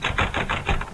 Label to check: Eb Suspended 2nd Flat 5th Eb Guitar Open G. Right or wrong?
Wrong